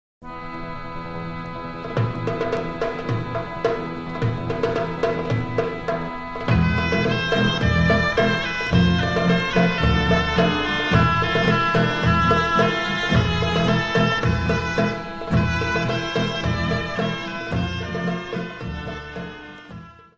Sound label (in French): Oro, Albanie